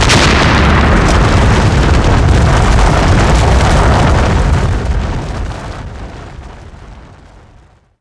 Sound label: explode; Garry's Mod; labubu mecha; mechacat jjk